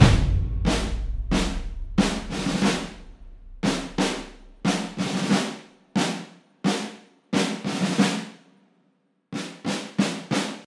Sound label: Drums; For Honor